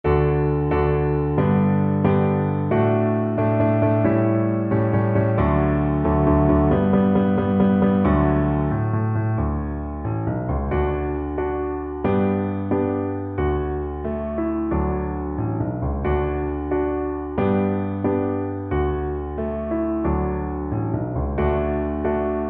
we shall overcome violin

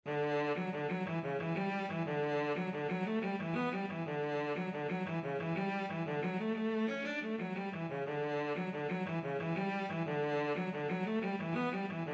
rolling jig, cello

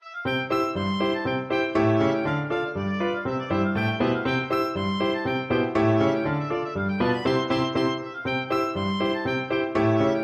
rheinlander polka, oboe

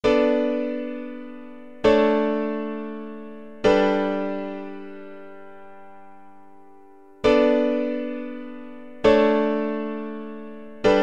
piano

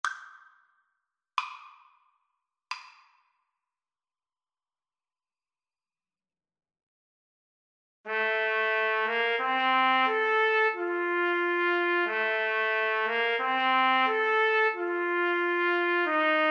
pongauer jodler TPT duet, Trumpet Duet

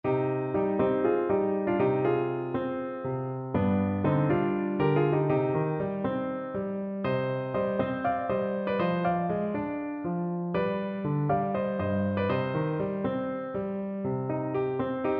ludo mlado, clarinet

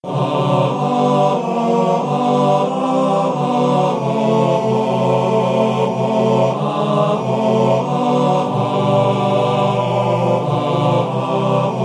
down by, barbershop quartet

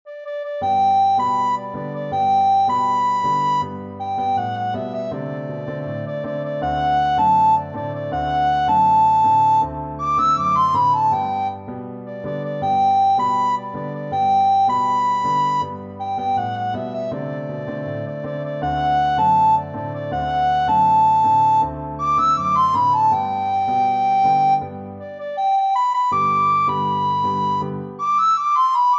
la cucaracha recorder; Mexico

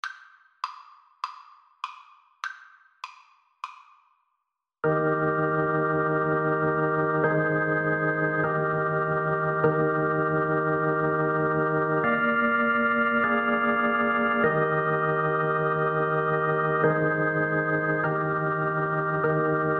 swing low, Saxophone

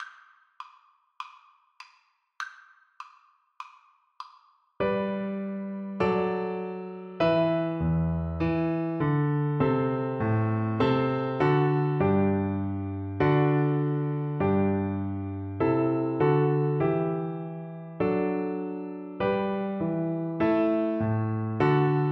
march, Oboe

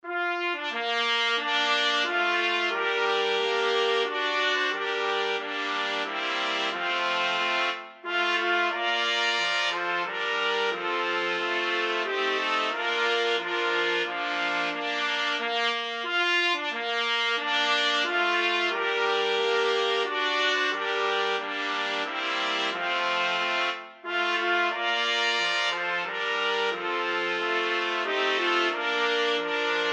trumpet quartet

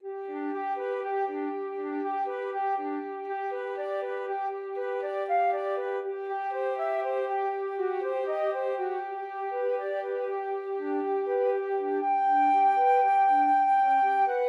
schubert ave maria, flute duet

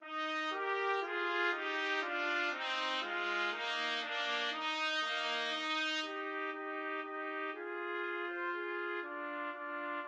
Menuett, trumpet duet

